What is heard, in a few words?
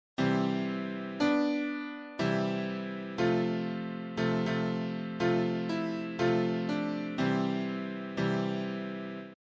buildings chords melody right chords